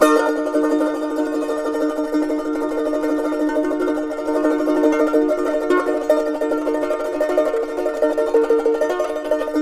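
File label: charango E2 minor tremolo; Spectrasonics; Hans Zimmer Guitars VOL-2; CHARANGO CHD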